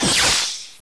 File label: shoot, paintball; paintballav